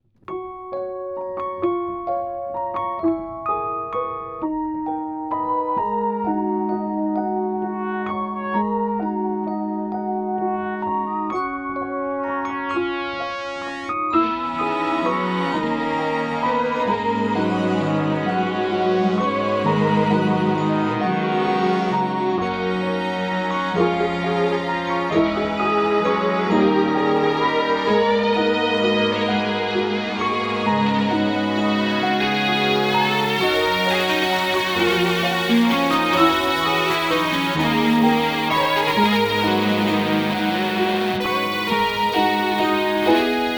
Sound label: only synth in church